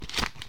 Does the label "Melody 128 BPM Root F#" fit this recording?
No